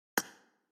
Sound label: open